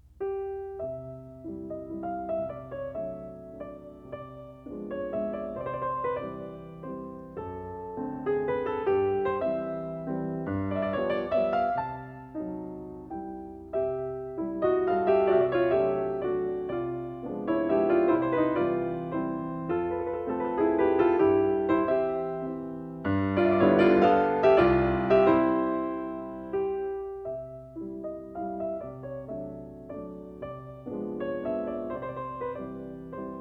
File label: Mazurka in C major; Frédéric Chopin